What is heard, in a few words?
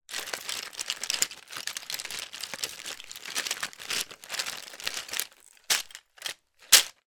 office stationery pens etc in large pencil case rummage